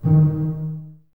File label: BSS D#3 Pizz, String Ensembles Basses; Miroslav Vitous